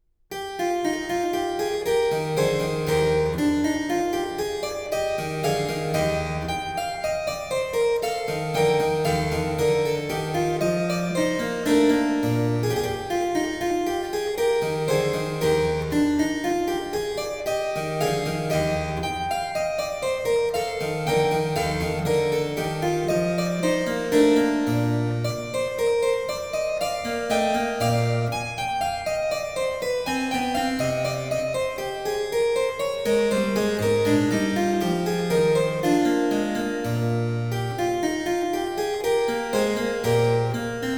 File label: French Suite, for keyboard in E major, Menuet; BWV 817 (BC L24); Bach